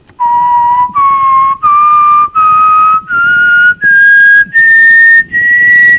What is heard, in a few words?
whistle